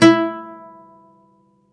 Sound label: OUD E3, Plucked Strings; E-MU Producer Series Vol. 7 – Old World Instruments